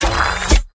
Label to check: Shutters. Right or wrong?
Right